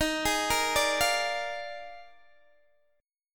Eb Major 7th Suspended 2nd Suspended 4th, Guitar DADGAD